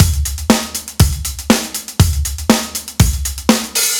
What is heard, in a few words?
16th Beat